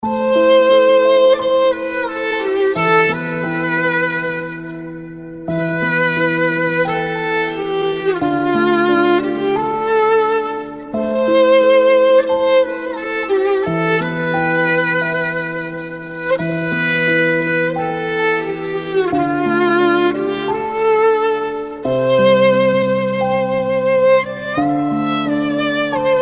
turkish sad violin

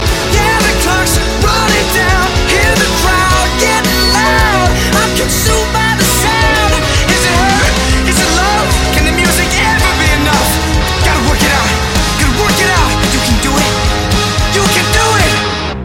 scream; zac efron; high school musical 3

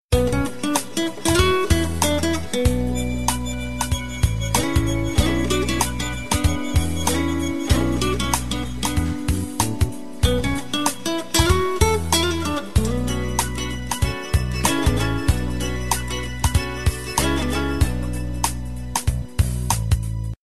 guitar

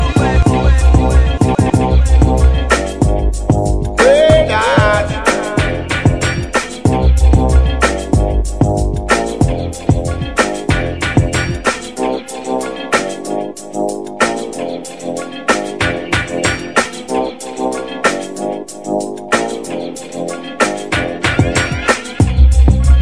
riddim instrumental

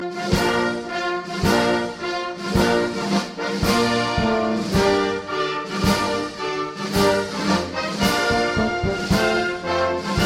military anthem

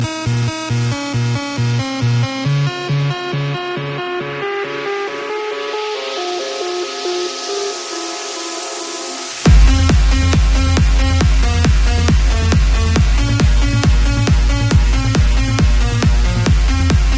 euphoria trance, ringtone

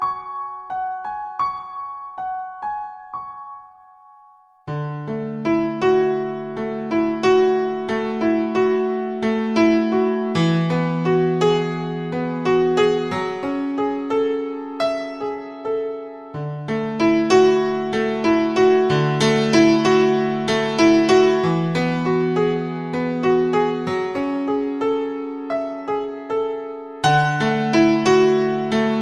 piano; gravitation yuki theme

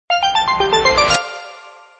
notification

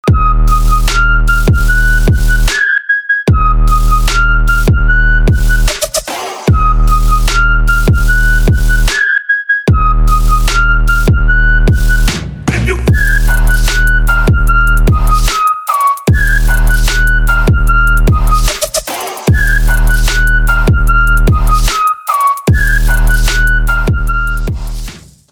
drums; ringtone

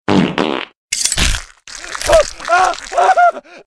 fart guns

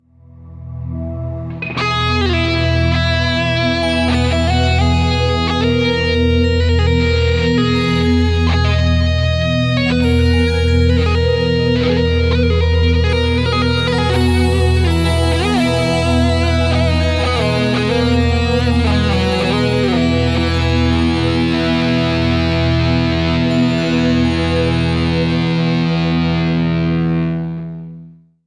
guitar

